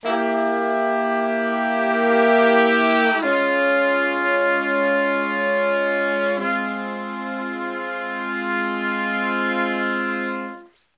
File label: Slo Minor Blus Chords (V,IV,I,V) (F); Sections; Crimson Blue and Fabulous Horncraft 4 RnB; Horncraft for R&B